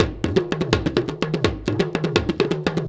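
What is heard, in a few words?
big tama groove; Best Service; World Colours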